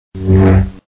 saber swing; Red Baron

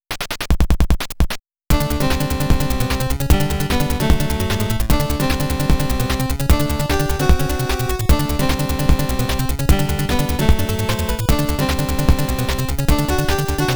Game BGM; konami famicom chronicle vol.1 disk system edition; Domestic DISK Ver.

